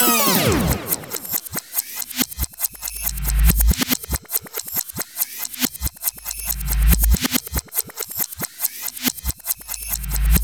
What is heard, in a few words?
Jingle rec Rev; Blinx 2 Masters of Time and Space; Xbox